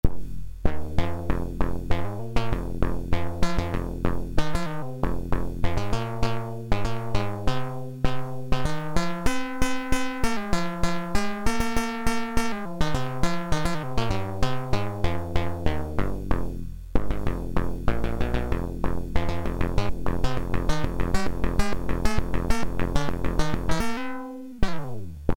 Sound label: Lead Synthesizer, Realistic MG-1 Concertmate; audio examples from the Patch Diagrams